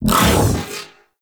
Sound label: Iron Dodge Burst; Warframes